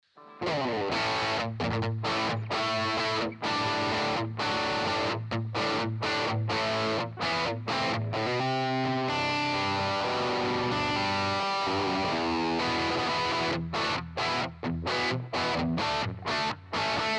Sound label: EHX 5150 Clean Overdrive L3 DI Out